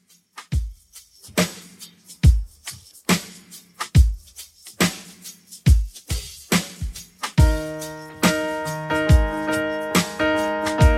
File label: 2ks Redrum 70bpm